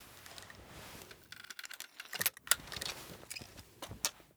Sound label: crossbow reload; Rust